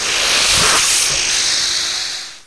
hiss, antlion; hl2

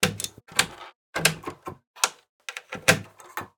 TRS Cable Plug In Solid Metal Thump, ARP 2600; Bolt; ARP 2600- Droids, Blips, Drones & more

